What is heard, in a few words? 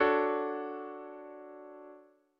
D maj7; ackordtabell